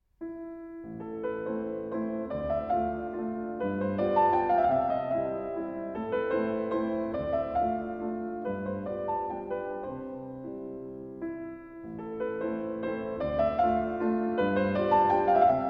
Waltz a minor; Op. posth.

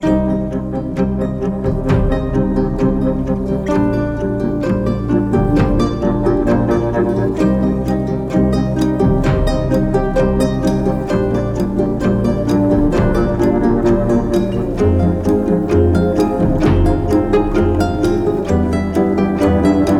techno

